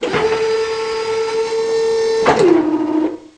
machine sound; SuperTuxKart

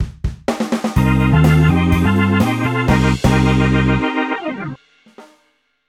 race finish; SuperTuxKart

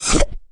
plunger; SuperTuxKart

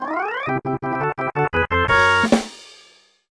last lap fanfare; SuperTuxKart

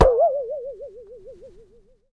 boing; SuperTuxKart

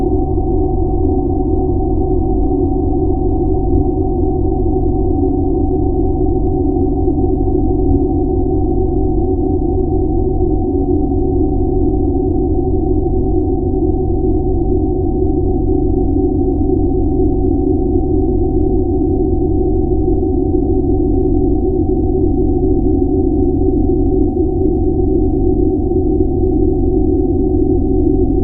spaceship; SuperTuxKart

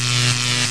bzzt; SuperTuxKart; stk enterprise

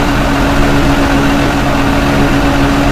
engine large; SuperTuxKart

